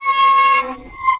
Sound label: gate; HEDZReloaded; effects